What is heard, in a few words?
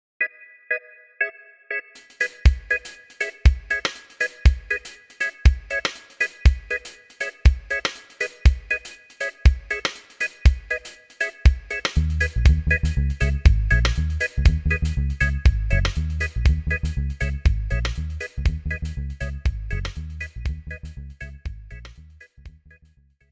song instrumental